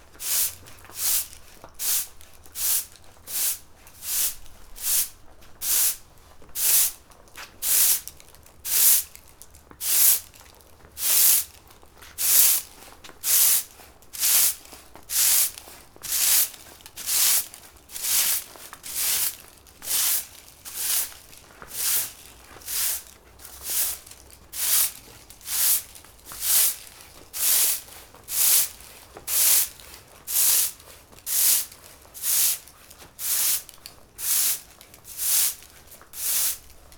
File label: sweeping the pavement; mixkit